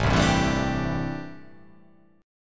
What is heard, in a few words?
A Minor 11th A, Chord